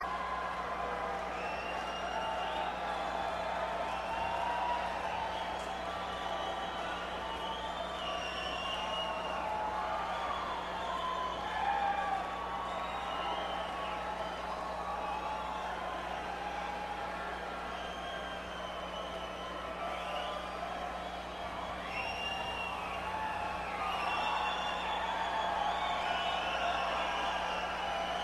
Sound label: Crowd Noise